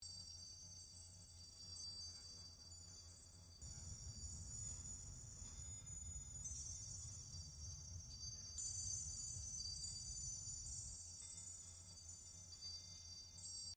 Floating Symbol Ambience Quad Surrounds; Journey

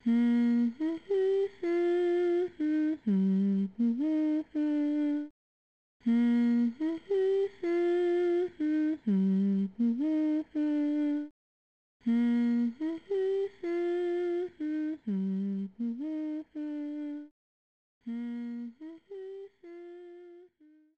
Lullabie; Imagine Babysitters; Imagine Baby Club

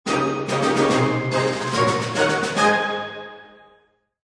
Boom Pvp Draw; Boom Beach

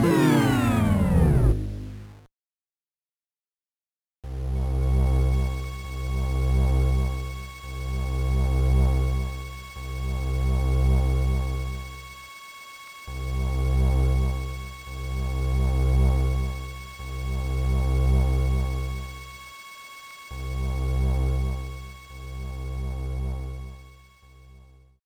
Power Down; Metroid Fusion 2002 GBA

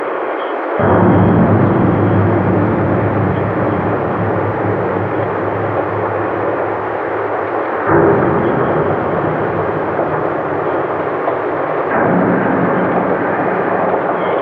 tubular ambient; Shattered Horizon; iss

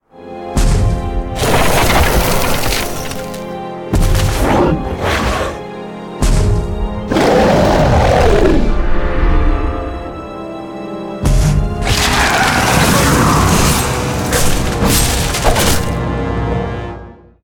Boss Intro Music

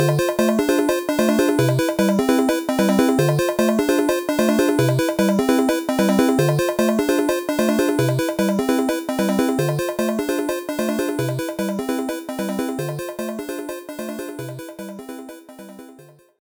Invincible BGM (Battle Mode)

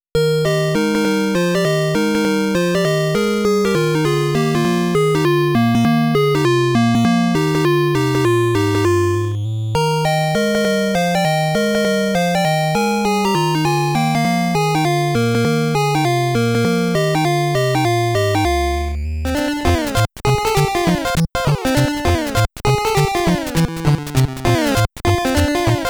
8 Bit Medley; Rare All Stars 35th Anniversary Collection; Slalom; Snake Rattle N Roll; Cobra Triangle; Digger T. Rock

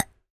Metal Tiny Hit Impact

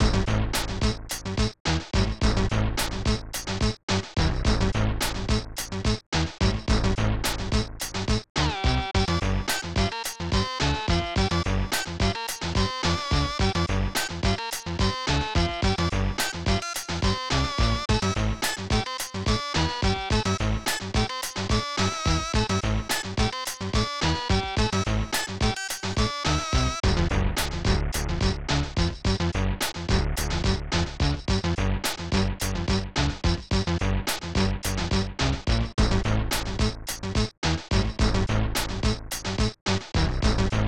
Beat Box Main Theme; Pinball Dreams; SNES

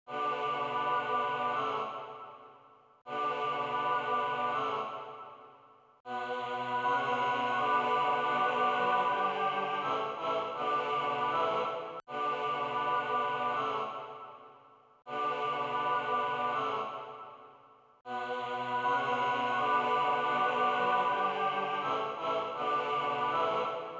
Dreadwood Boss C 160 HI

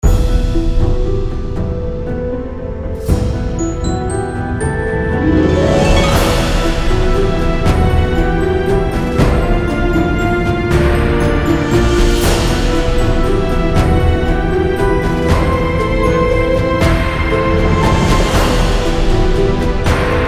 Bgm Ice Dungeon; Delphinia Chronicle

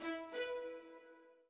orbit violin; Sky Children of the Light gamerip

